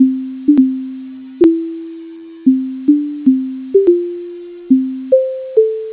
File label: induced Note